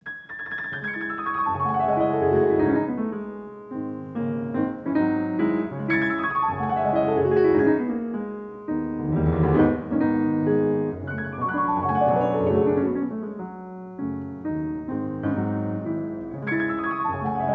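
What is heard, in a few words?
C sharp minor, piano; Chopin; Preludes; Cis-Moll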